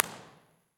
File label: Steinman Hall, Impulse Response; EchoThief Impulse Response Library